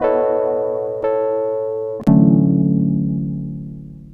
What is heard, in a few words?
Funk Keys; Kraftona; Sonic Foundry (Sony Creative Software); Synchro Funk